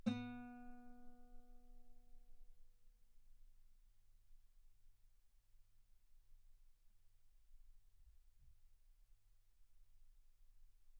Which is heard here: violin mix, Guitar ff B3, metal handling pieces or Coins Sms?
Guitar ff B3